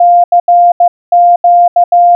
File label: cq, morse code